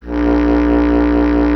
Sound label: B. Clarinet